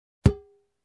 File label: Ballon hit